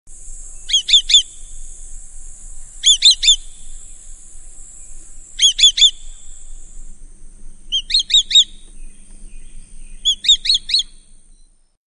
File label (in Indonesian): walet putih